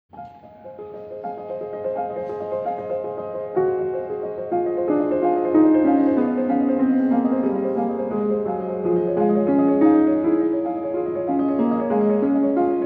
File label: Waldesrauschen (Forest Murmurs), for piano; Liszt; Zwei Konzertetuden No. 1